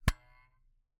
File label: Camera, Aimex SP500, point n shoot, plastic, knock; Eiravaein Works; Vaeyan IV